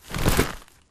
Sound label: Grunt Mvmt FOL Soft Med; Titanfall 2